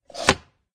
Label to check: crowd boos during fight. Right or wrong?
Wrong